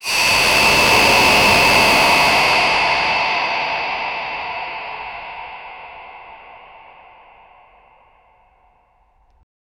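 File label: long shofar blow cinematic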